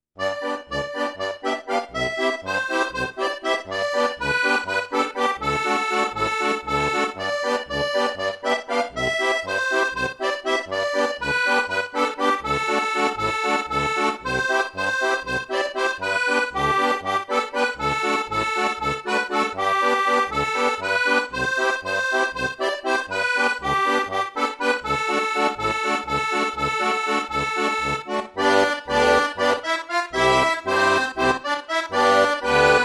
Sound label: bulgarian dance, folk